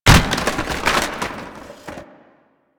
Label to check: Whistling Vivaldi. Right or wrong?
Wrong